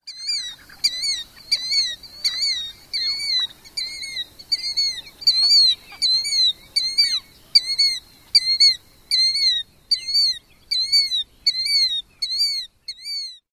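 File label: flight display, bird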